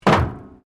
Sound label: METAL BIN IMPACT PLASTIC CRASH BARRIER